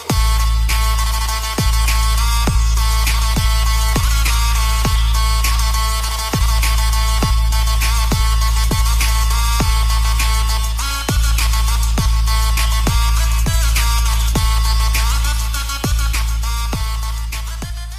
new english cool ringtone; english ringtones

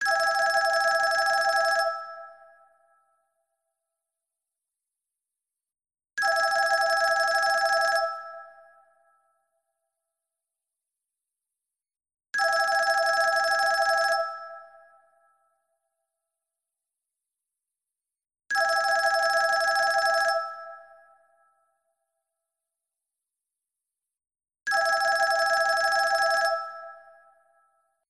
old phone ringing caller tone ringtone

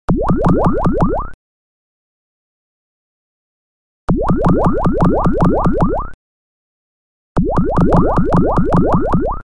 under water ringtone

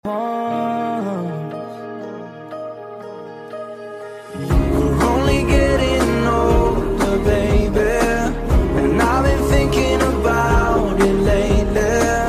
ringtone, english

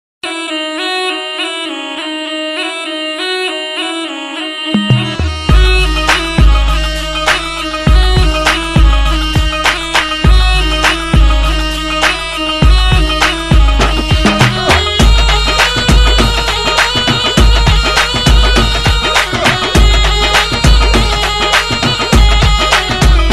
snake charmer mix ringtone

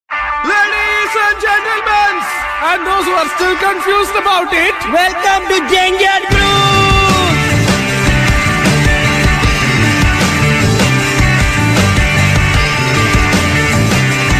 rock n roll ringtone

